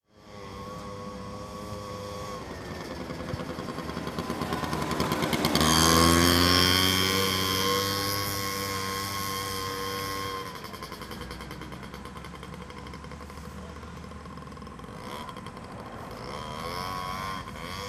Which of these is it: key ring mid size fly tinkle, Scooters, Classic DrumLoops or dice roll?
Scooters